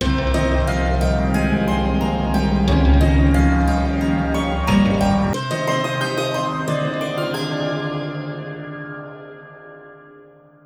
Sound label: Melodic C